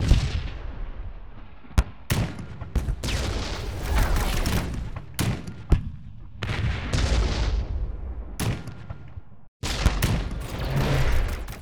air war amb

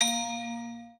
ui card bounce

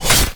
sand axe

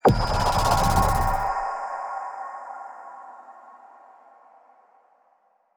lantern soul boost

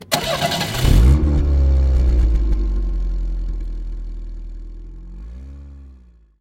sports car start

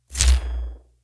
atk elec mag cast

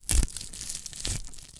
cut meat one

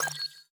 ui emoji dialogue

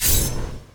draw fire sword

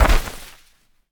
flag end

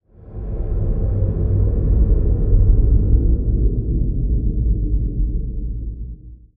air buzz

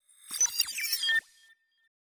match cancel, ui

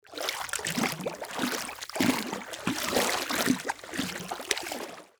run water

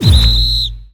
ui over

